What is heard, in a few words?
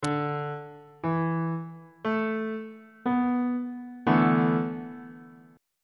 d double sharp dominant 7th suspended 4th 3rd inversion; bass clef